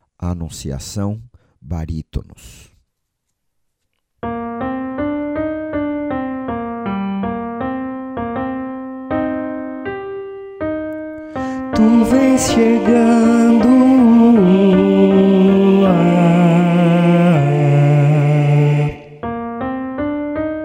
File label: Barit